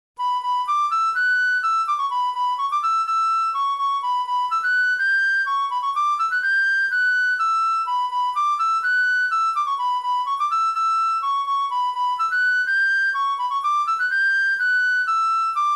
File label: flute